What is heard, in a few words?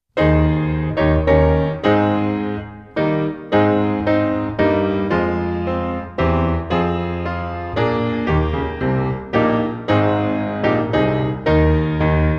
The Star Spangled Banner (Accomp), trumpet; The Canadian Brass Book Of Easy Trumpet Solos; Hal Leonard